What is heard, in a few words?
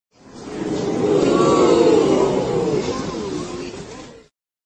oooo crowd sound